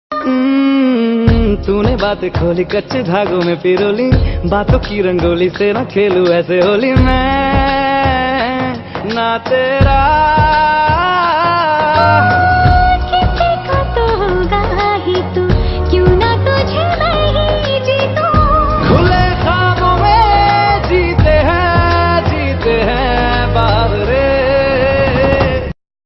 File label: hindi ringtone